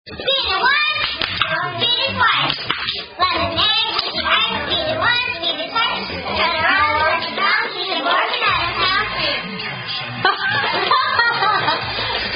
Lemonade Crunchy Ice Hand-Clapping Rhyme Game

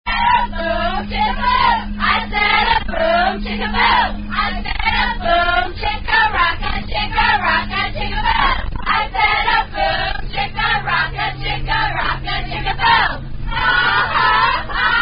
I Said A Boom Chika Boom; Related (Perhaps) To Korean Bunny Non-Drinking Game

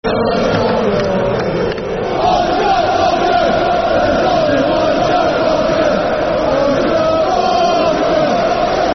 Football Chants (Soccer Songs); Jack Walker; Blackburn Rovers Brfc

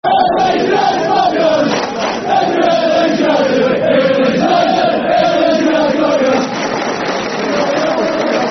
Football Chant; Hate Leeds United; Huddersfield Town Htfc

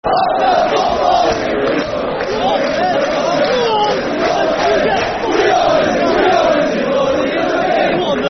E I E I E I O, Football Chants; Sheffield Wednesday Swfc

